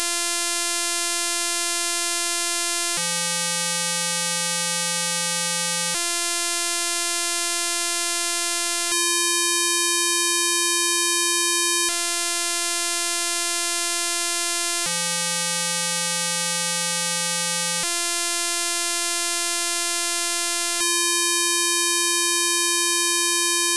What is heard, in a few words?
buzz